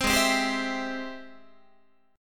Ab Augmented Major 7th Ab Guitar 4A,4E,5A,5D,5G sharp,6C sharp; strummed; 15,16,15,17,16,15; JGuitar